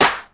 Raw Snare One Shot G Key, snare drum tuned to the G note. Loudest frequency: 2364Hz; snare drum samples